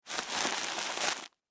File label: Food Bread Wrapper Close Loaf; Household